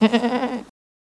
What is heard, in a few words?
Goat Baah, Neigh; goats